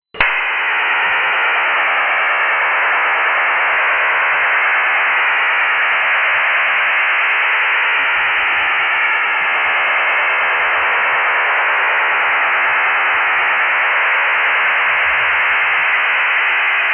STANAG 4285 STANAG 5066; STANAG 4285 ARQ burst with STANAG 5066 and HMTP at 2400 bps, short interleaver; STANAG 4285 ARQ BURST WAVEFORM

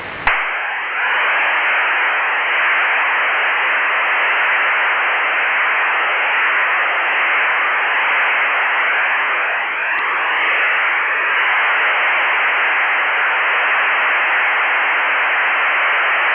Q9600 HDR MODEM (SERIAL); ROCKWELL-COLLINS; (MIL-STD 188-110B App. C compatible)

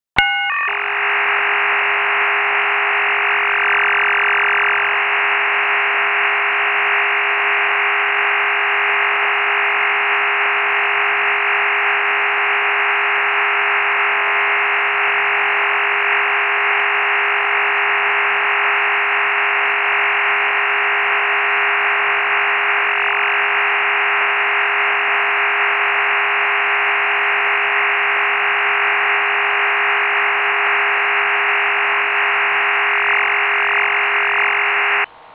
MIL-STD 188-110A/B App. B 39-TONE (PARALLEL)

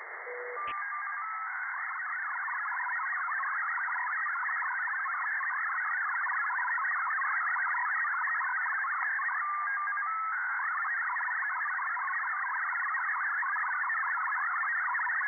MFSK-10 HYBRID

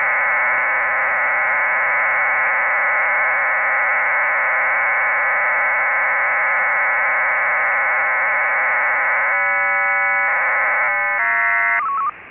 DIGTRX EOT

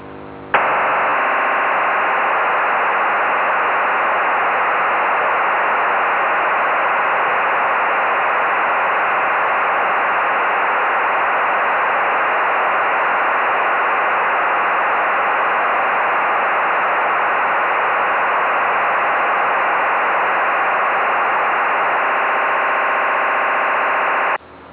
CZECH 2400 Bd (SERIAL), Burst mode; (Burst mode, HFCOM2 ARQ, MIL-STD 188-110A)

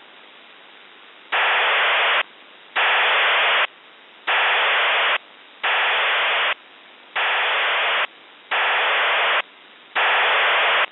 RUS 3000Bd 8PSK serial tone short

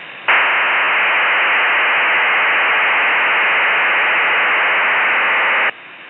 MIL188-110B App C PSK; MIL-STD 188-110B App. C PSK waveform; SERIAL TONE WAVEFORMS; PSK-systems

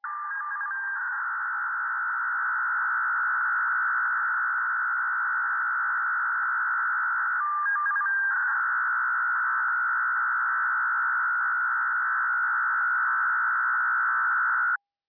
PSK n x 62.5 Bd QPSK (PARALLEL); (Russian Intelligence; Russian Intelligence / experimental mode? / active 2011

